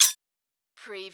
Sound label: Button Sound - Metal High Tap